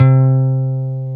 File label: Jazz Guitar C3; 90's Sample CDs; Club-50 - Foundations Roland; Jaz-Emperor